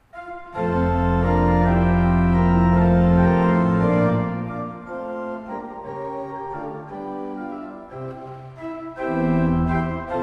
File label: Fleiter, pipeorgans